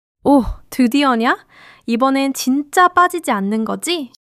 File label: laughter; monologue